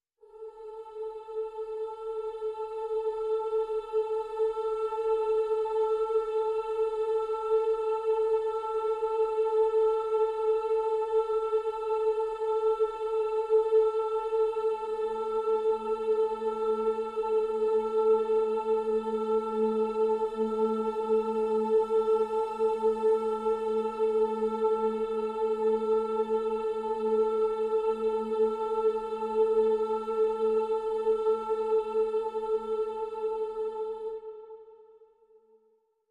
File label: Pads Vocalicious (A)